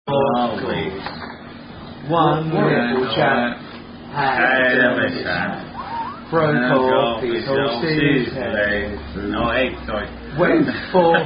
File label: Song